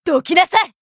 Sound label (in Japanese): 攻撃; ベアトリーチェ